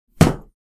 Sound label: Kicking bag karate or taekwondo